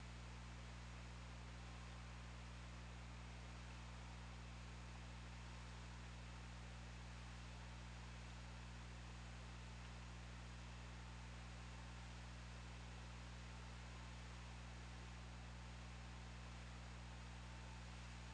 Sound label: Orca; OrcaLab